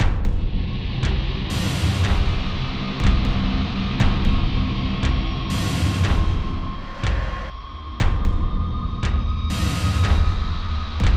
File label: Danger Tonal; Insanely Twisted Shadow Planet